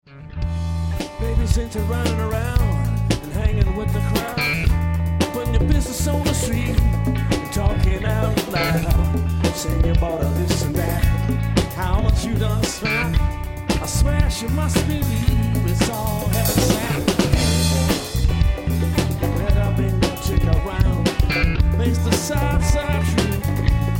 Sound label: cover band